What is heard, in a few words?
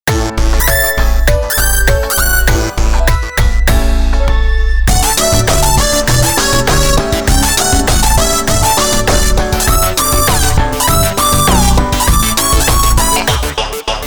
annen polka; strauss